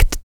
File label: HIT, Jazz FX FEM PERC; Spectrasonics; Vocal Planet